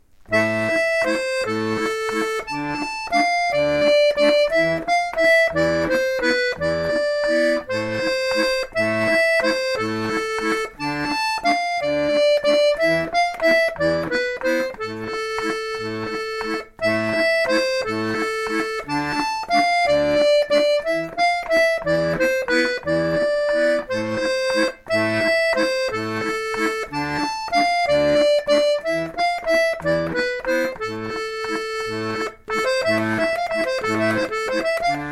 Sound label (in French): valse